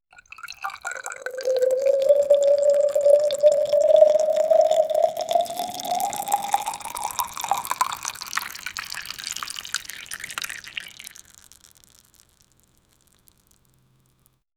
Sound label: water in glass bottle